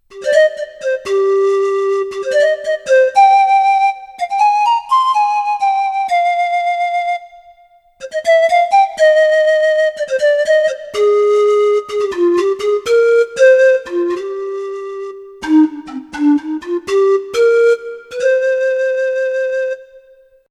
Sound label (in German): DP33 Panflöte